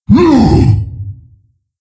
Attack, Creature; Steelbreaker

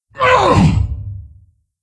Wound, Creature; Steelbreaker

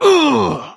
troll wound; Halazzi

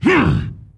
Attack Light; Jaraxxus

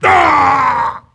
Wound Heavy; Jaraxxus

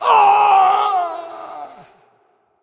Death; Creature; Bolvar Fordragon

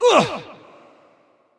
Wound; Bolvar Fordragon

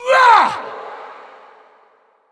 Attack; Creature; Bolvar Fordragon

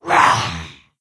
Attack; Tenebron

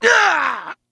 wound crit; Mage Lord Urom

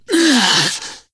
Wound; Ionar

